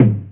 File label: winnie, Kicks Medium